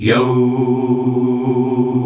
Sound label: YOOOOH C, male choir; Best Service; Hyper Dance